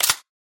gun pickup

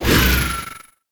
sword sundering strike

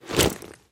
chest pickup

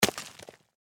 obstacle hit stone

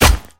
spade hit

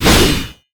sword slash strong

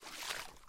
footstep water

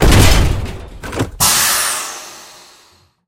airdrop open

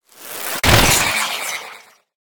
monster ghost elf attack

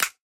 scope pickup

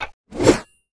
monster skeleton attack

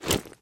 stow weapon

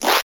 weapon bow draw arrow

